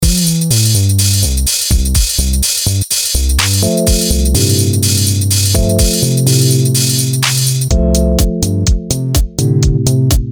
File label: beat; bpm:125